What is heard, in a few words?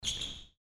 sneakers squeak